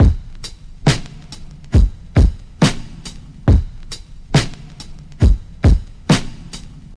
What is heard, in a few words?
69 bpm breakbeat sample d sharp key